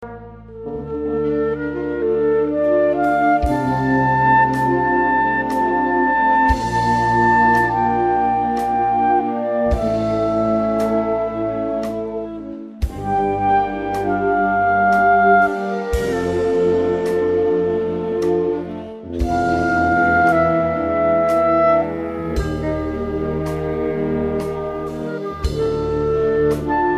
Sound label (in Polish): Mieszanka filmowa, orkiestra